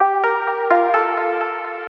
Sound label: Chill Alert